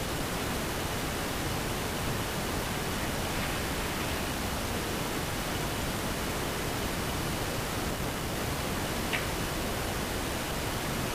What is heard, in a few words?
Mattress sound and Whistle; Cottage Recorder